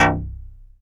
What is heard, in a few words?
MTL, SYNTH BASS; East Collexion; Bass S3000